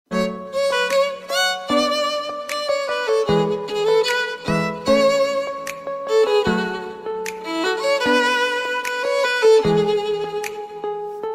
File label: soft ringtone instrumental ringtones piano ringtones flute ringtone